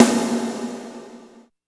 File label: VERB SNR; East West - Phat & Phunky CD2; 90's Sample CDs